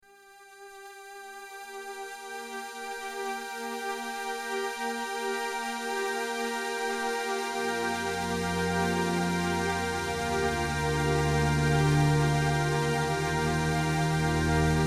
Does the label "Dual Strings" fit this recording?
Yes